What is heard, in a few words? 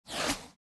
Ninja Swipe; Fancy Pants World 1 Remix